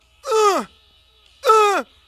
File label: ooh ooh; David Lee Roth